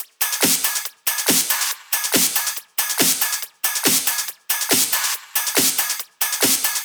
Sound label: drum Loop 140BPM Full